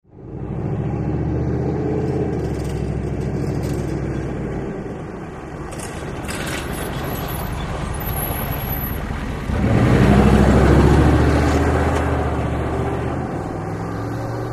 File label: Humvee Slow By Accel